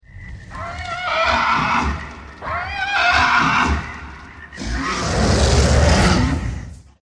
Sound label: prowling plateosuarus